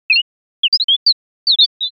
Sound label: chirp mix